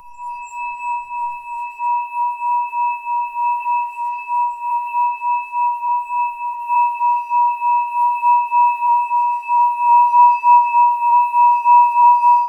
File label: high pitched tibetan sing